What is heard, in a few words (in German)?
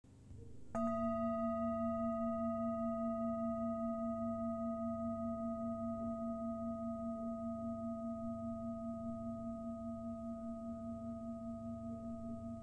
Antik Schale